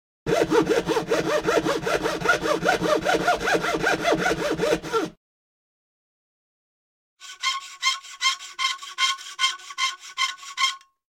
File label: Filing; 100 sound effects